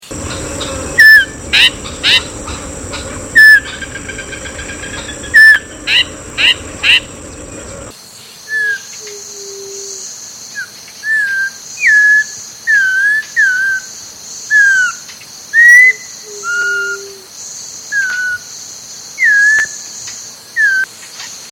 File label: audubons oriole